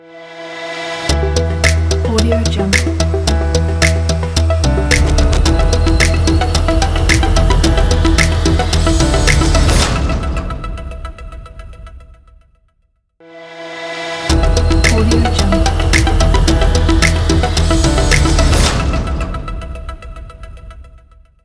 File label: news logo technology